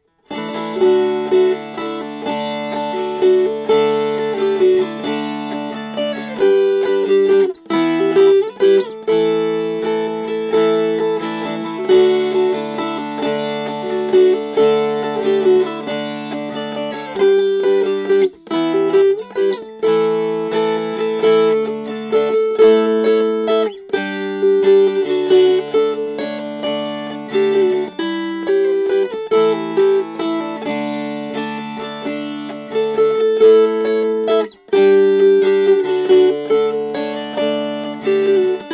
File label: Strummed